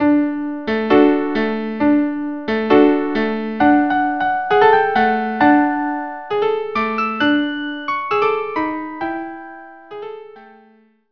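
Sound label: violin viola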